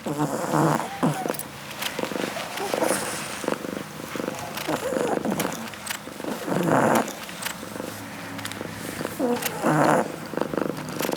Sit Spawn Bunnies Snore; Dendria

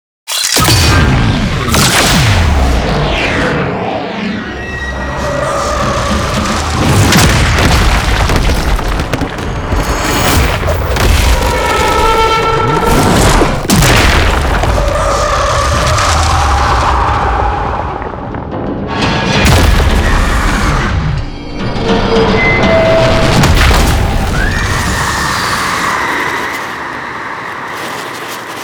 Sunrise Cin SFX; Cinematics

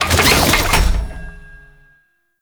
Customization UI Close; Veilbreaker; Kahl

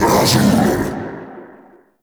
Cast Vocal; Warframe; Uriel; Brimstone

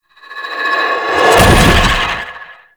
Horror Stinger; Warframe; Tau; Cinematics; DarkRefractory

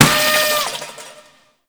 Party Balloon Pop; Shadowgrapher

